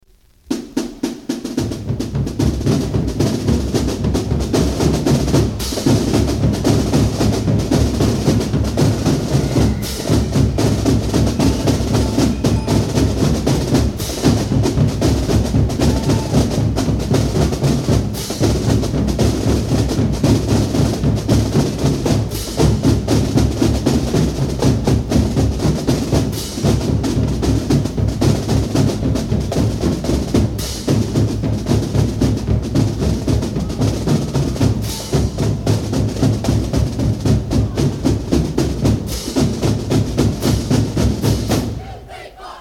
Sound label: Opening Drum Cadence and Fight Song